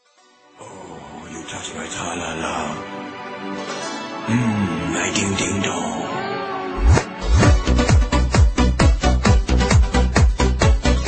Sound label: ding ding dong song